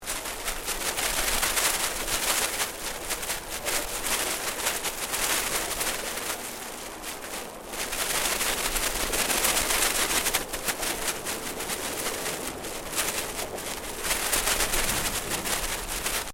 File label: PLASTIC TORN SHEET BLOWING IN WIND, Plastic bags caught on a fence.; Plastic (Sound Effects:Materials)